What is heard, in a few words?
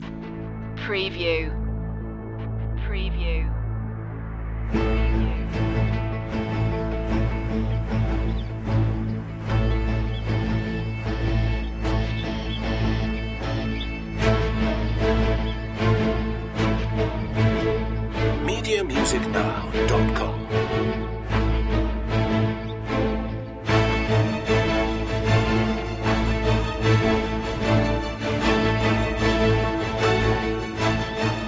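exodus no percussion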